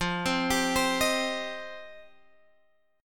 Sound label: F 7th Suspended 2nd F down Guitar Open G